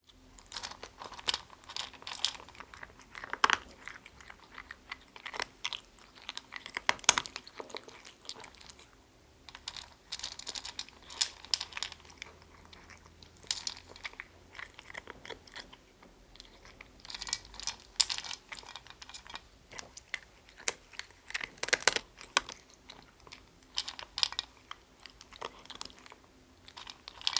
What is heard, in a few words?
Cat Eating Dry Food; SES Animals SFX